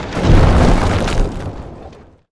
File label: Gate Open, mine; KuberaMine